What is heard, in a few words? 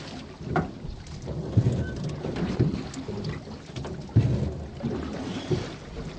boat idle